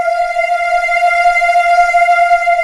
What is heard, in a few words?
DREAMS, STACK